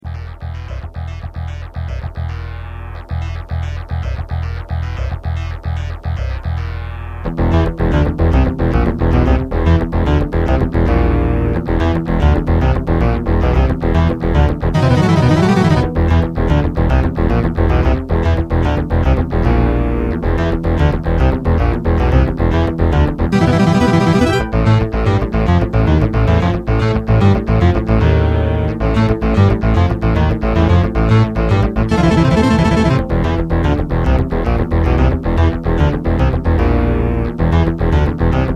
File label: on 80s yamaha electone